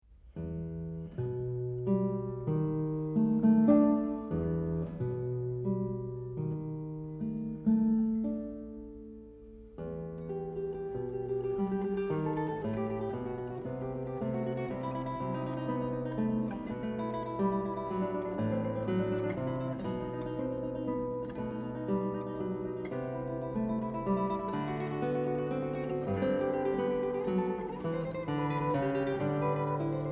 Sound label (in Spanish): guitarra